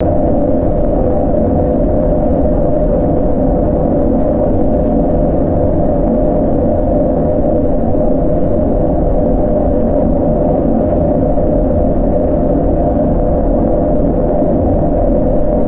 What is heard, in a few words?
hollow eerie wind drone pulsing; designed